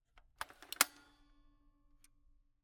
Camera, Kodak EK2 Instant, polaroid, large, plastic, springs, reset; Eiravaein Works; Vaeyan IV